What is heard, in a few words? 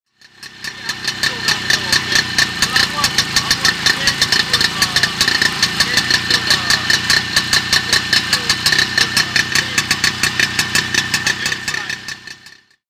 scootacar idle